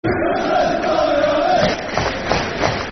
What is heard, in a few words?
Football Chant; Bury; fanchants-com